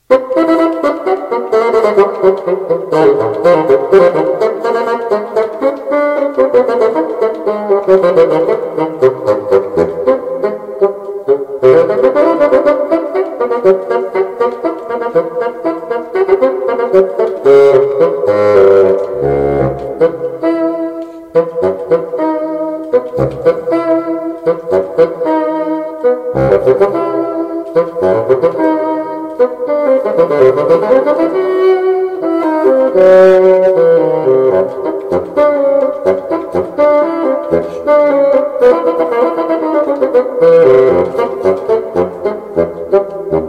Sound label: intermezzi for bassoon solo